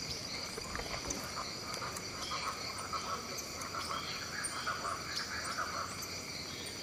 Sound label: Speckled chachalaca; پرنده گوش خراش خالدار